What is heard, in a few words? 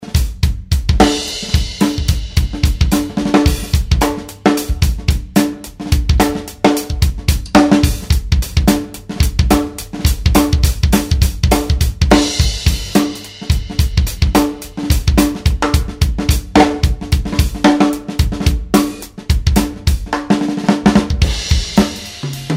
Funky snare drum; Slingerland